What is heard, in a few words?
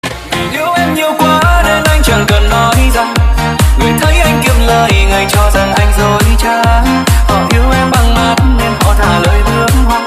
remix